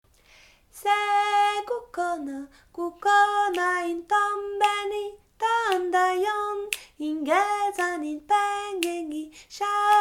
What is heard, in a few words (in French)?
kukhona chant seule